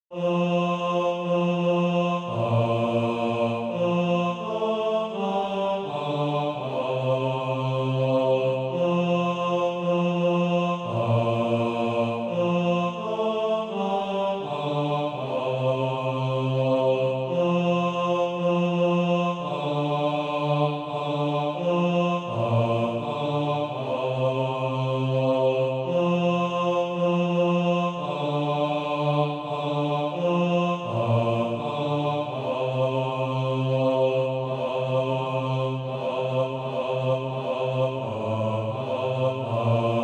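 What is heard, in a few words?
bass, SATB Practise Music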